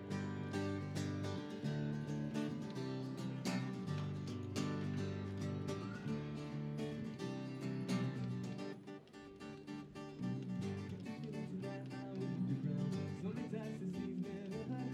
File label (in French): Voix; Concert Loix